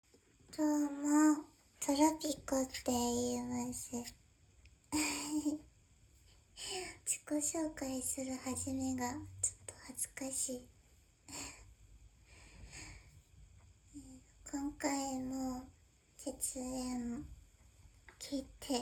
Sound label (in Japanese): 実演オナニー ほろ酔いボイス 甘えながら10回以上連続絶頂